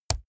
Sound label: keyboard laptop key push hard